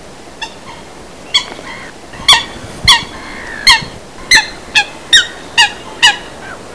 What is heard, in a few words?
coot, birds